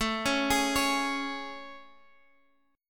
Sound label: A Major 7th A, Guitar, Half Step Down